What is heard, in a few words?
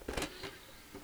disc unclamp BDR-211JBK